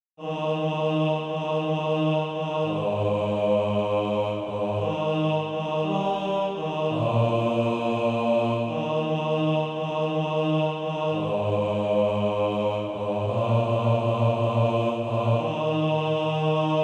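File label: bass, Practice Music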